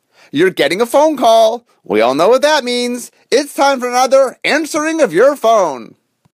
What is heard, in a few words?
Ringtone